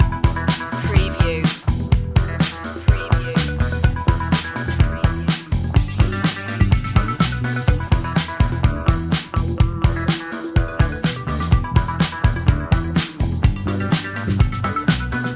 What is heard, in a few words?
disco vibe loop